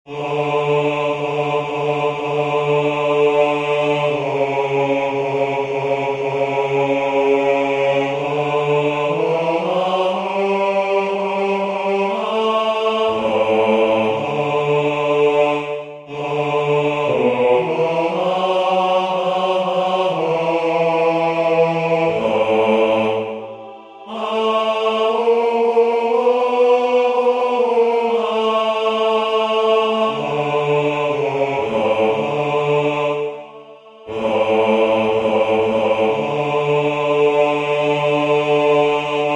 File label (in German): Heilige Nacht Bas